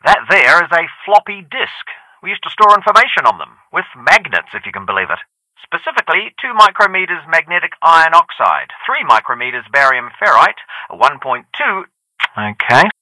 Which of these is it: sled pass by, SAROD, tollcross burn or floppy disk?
floppy disk